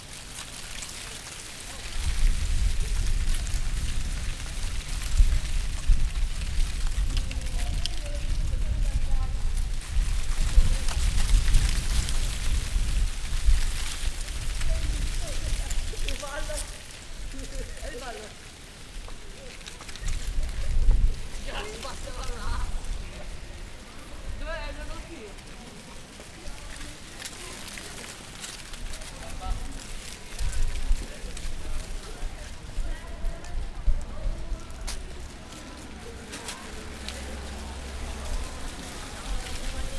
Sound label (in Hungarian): szélkergette zörgő falevelek